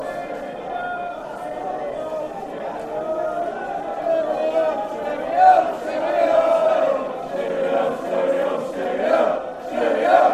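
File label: cheerio cheerio stockport, football songs and soccer chants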